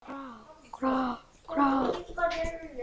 cry

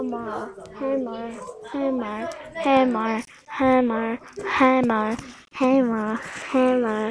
hammer

